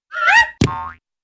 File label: whirly slip and fall